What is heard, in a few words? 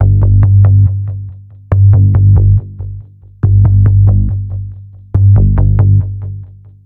Bass